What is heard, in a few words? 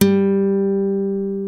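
Nylon Gtr G2 Op Hard; Mo' Phatt; Digital Sound Factory Mo' Phatt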